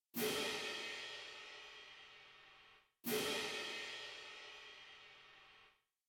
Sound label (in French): cymbales frappees exemple sonore